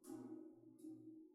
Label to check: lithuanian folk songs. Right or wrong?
Wrong